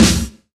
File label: perfect steel snare drum sound D key, snare single hit tuned to the D note; snare drum samples